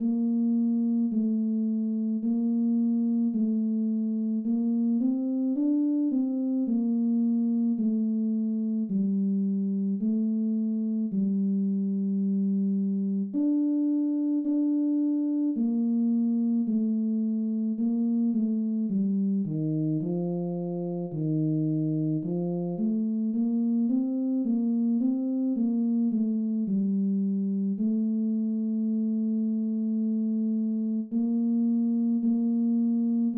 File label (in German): tenor